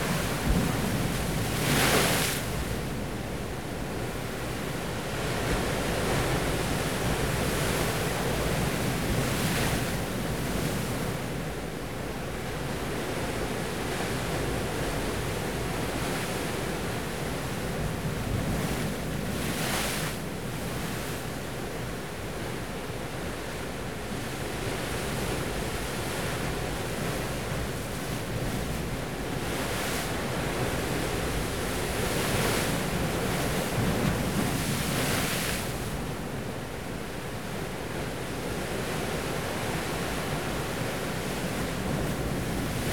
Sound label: Ocean Waves Strong Cliff; justsoundeffects